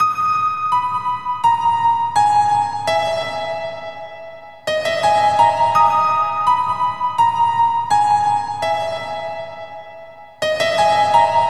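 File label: PNO, piano, Drum & Bass; Best Service ProSamples vol.03 - Drum & Bass 1; AKAI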